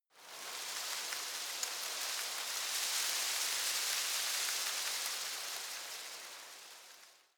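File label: shrub little leaves rustle soft, garden; haus-garten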